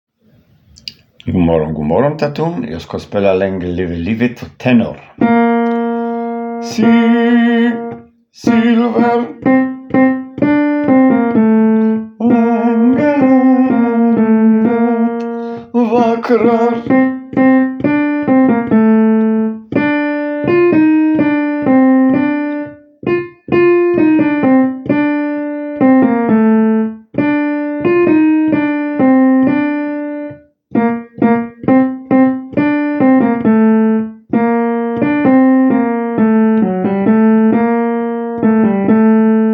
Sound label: tenor